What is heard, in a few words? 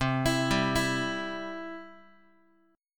C Major, Guitar; arpeggiated; JGuitar